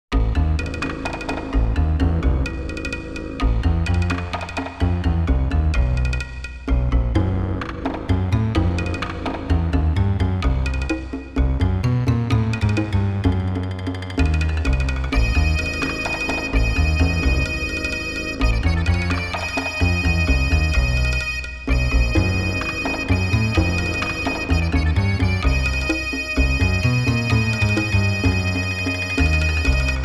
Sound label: Dungeon; Alundra